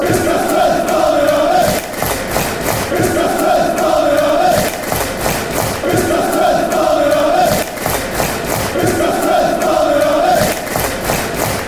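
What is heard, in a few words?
barmy army, football chants; chris caspers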